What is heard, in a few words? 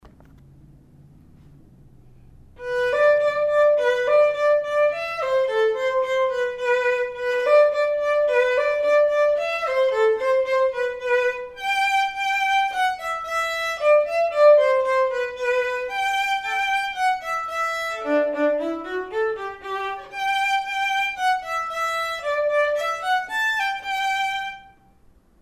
perf vln